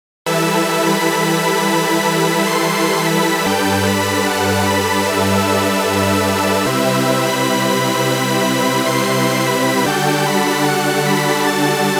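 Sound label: oscillator A B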